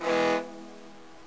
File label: boat horn; Wonder's Greatest Toyshop on Earth (1996); OmniMedia